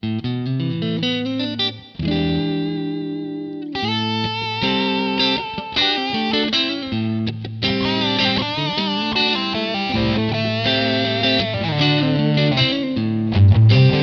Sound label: Electric Guitar Sounds; Dejardin Guitare